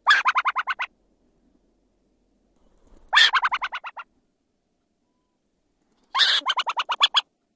chatter call alarm

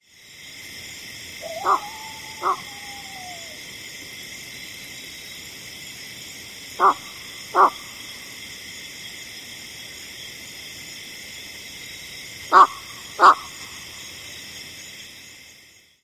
flight calls

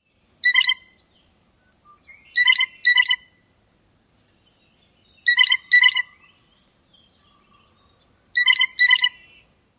piping notes; NY

